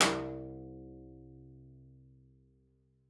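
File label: CELLO spiccato C5; 90 s Sample CDs; Xsample VOL-5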